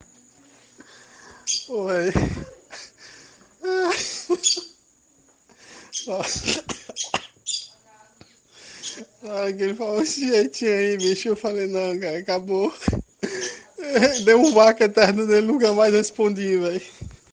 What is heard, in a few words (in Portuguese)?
risada